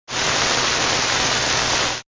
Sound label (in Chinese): 收音机没信号声音